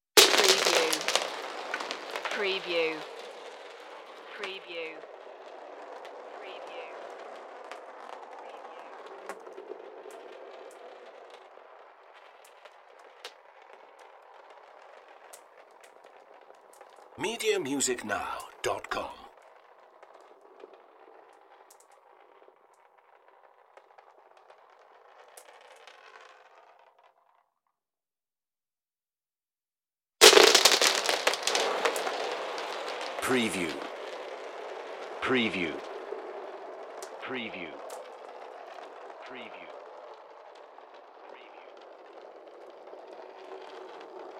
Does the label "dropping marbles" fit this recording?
Yes